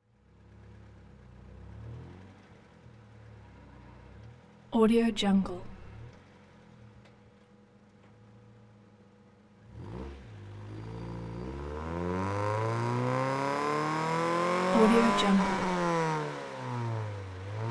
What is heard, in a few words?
onboard street race car driving fast with revs and corners